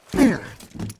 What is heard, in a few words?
PEACE DAMAGE; HUMBABA; Mob